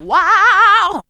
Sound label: VOX